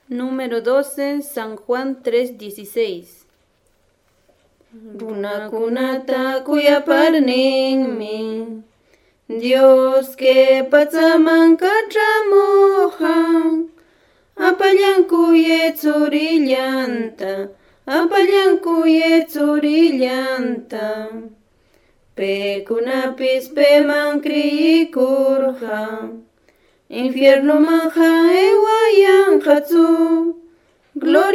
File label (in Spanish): cancion